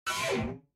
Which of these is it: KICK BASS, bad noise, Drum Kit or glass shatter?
bad noise